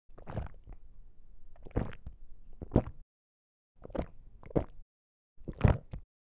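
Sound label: swallowing; Lewd SFX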